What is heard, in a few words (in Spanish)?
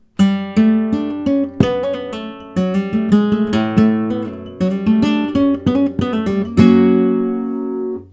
falseta